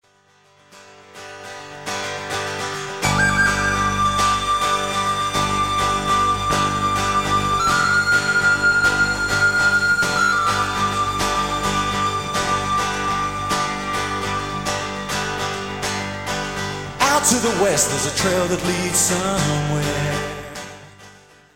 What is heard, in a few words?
recorder